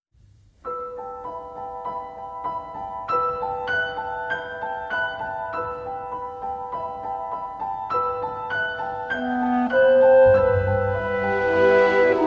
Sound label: Melody With Clarinet